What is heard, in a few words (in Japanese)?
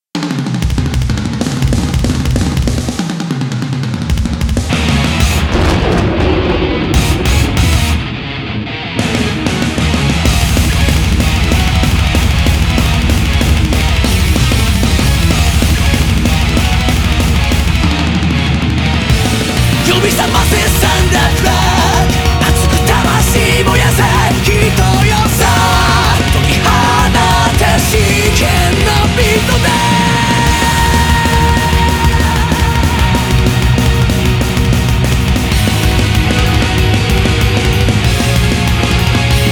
カラオケ